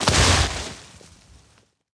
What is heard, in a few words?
treefall down; World of Tanks Blitz